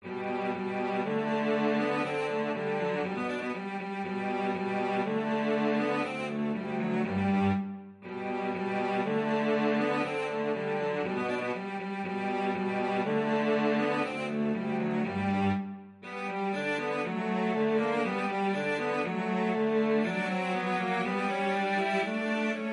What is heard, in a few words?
VLC DUET, cello duet